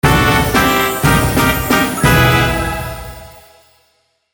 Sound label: Start Game; Run-and-capture; Sound Pack 01.09